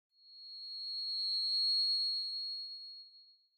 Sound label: Microphone Feedback